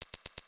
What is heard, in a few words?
count up; netent